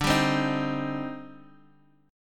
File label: C Minor D; Cm/D strummed; JGuitar